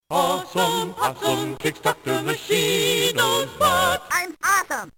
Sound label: awesome piano intro; hip hop